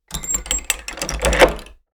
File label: Heavy complex lock rotating mechanism; locks keys